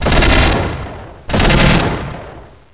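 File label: sword fight; NAPOLEON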